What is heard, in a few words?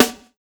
NATURE SNR; BONUS SN2